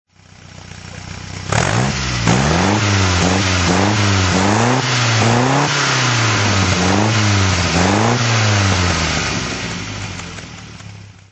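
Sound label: MGA 1500 throttle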